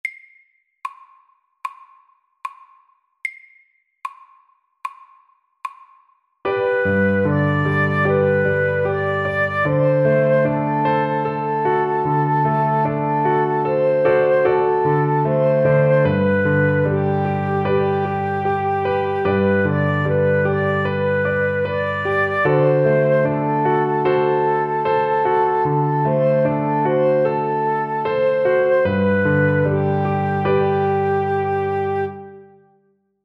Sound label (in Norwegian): Walesisk melodi SAKTE